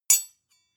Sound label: sword hit clack soft